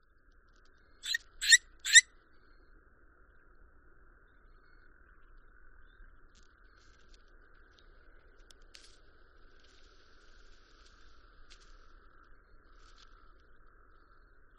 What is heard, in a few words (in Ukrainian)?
Сова вухата, Asio otus